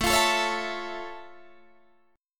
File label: F Augmented Major 7th A